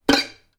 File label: Violin Screech Noise Short; soundscrate